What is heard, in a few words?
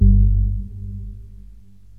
SYNTH FX